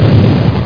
Blow; tanc

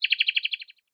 natura search; Spik

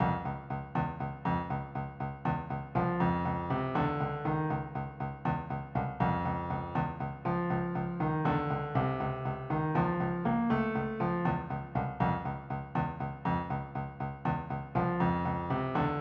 blues; 3800 MIDI Magic

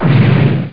Bridge Hit; Star Trek demo; Star Trek Audio

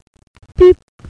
New mail; netscape; messenger

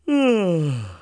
uhhh; Wired for Sound Pro CD; Aristosoft, Inc.; homer

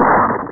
crush; Hottest 5 (1995)(PDSoft)

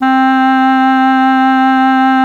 clarinet; GMPlay; Reed

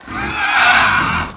Ray Activate; PC Gamer IT CD 31; Defiance

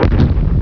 small explosion; Star Flick

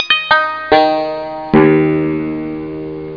banjo; music mouse demo

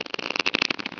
bow unstretch; vampire demo

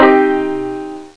banjo; Sound Effects for Multimedia - Volume 1; string instruments

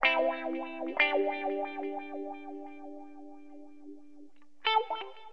WAH WAH, Guitar

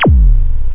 peow!; music samples

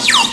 Slide whistle

